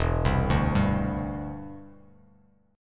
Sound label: E Minor 7th Sharp 5th E; arpeggiated